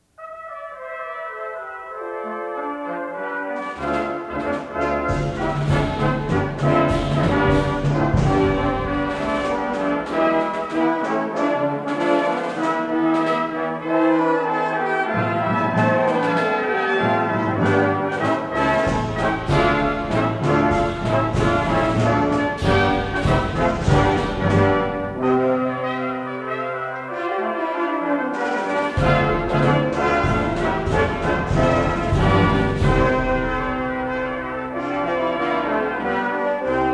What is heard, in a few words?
Festival Fanfare Selection